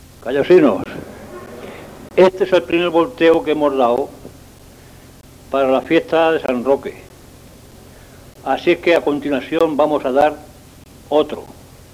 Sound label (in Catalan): campana